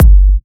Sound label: Sub Kicks, Bassdrums